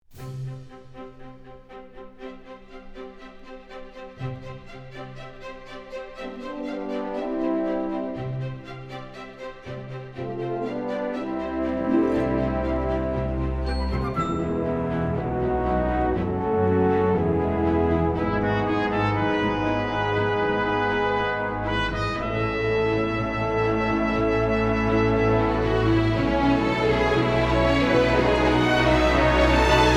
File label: news grand bumper trumpet; CTV